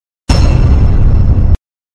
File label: hard hit comedy sound effect